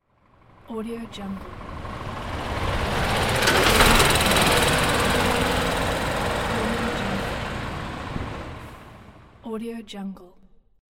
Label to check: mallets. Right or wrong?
Wrong